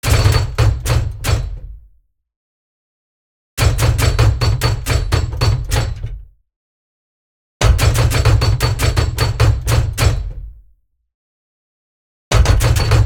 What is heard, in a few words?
GEARBOX - PERFORMANCE Metal Large; Rock The Speakerbox